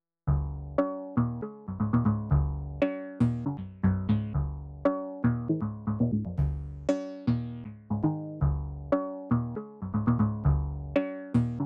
melody alone

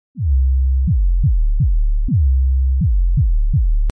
FILTER

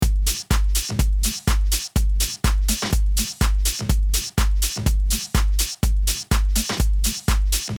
bypassed without compression

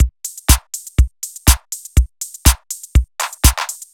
quirky cr78 drums

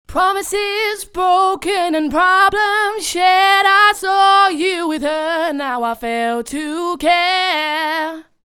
time stretched

